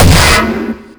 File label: SNARE SCRAP